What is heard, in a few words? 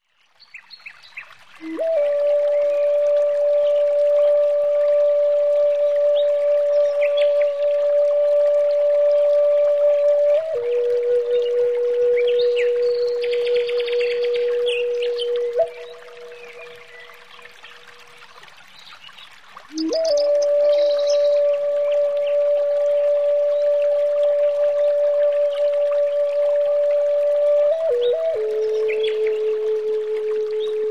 Song